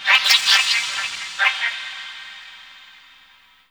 SPACE NOID, DANGER SUSP; Spectrasonic Distorted Reality 1

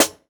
Snare, jungle breaks drum sounds

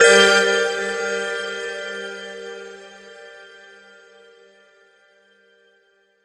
Dragon Bell

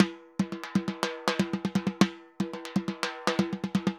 TIMBA, MERENGUE; Best Service ProSamples vol.14; AKAI

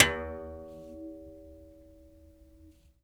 Tar, One Shots, World Percussion; Sony CS

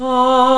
VOICE & BELL

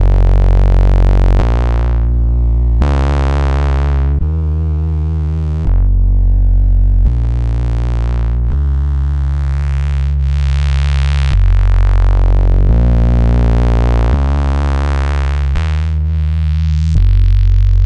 Melodic, Drum n Bass, Bass Loops; KJ Sawka; Drum n Bass & Beyond

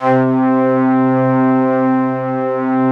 ST ORG, STRINGORGAN, Yamaha VP-1

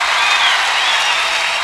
CROWD; Vox & Voice

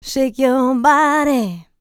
licks, vocal, dance; ILIO; Vocal Planet

